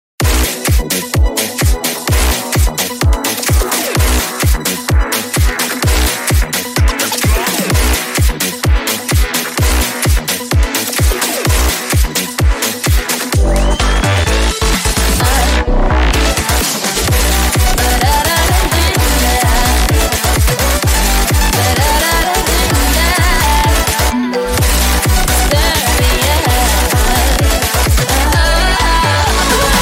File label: mashup breaker 128 bpm